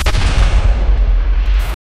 space ship

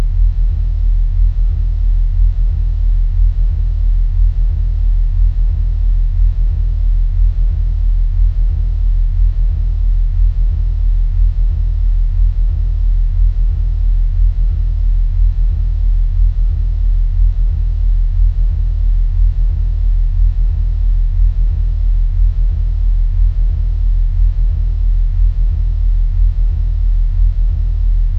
echo corridor footsteps, hallway

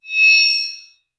a mystical chime ethereal, bell tone

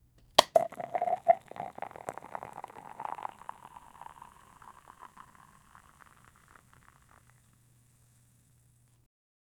sound of a beer bottle; beverage

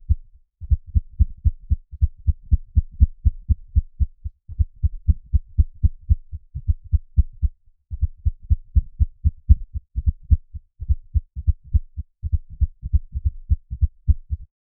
heartbeat, human body

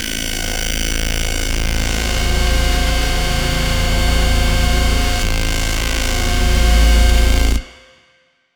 industrial techno synth

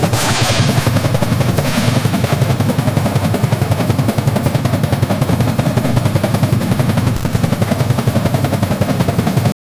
full gallop cavalry charg, horses

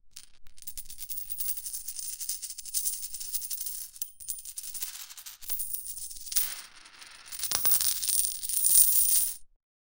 soft clinking of coins sh

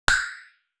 a dry soda candy energy; chemical

